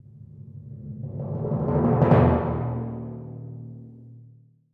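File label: timpani roll crescendo d#1, percussion; Sonatina Symphonic Orchestra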